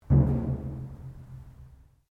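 Drum Taiko Odaiko piano, Large Japanese Taiko Drum; Miscellaneous Musical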